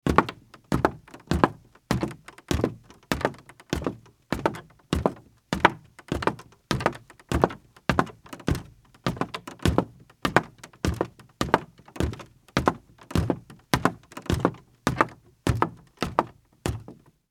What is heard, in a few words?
Footsteps Heels Wood Up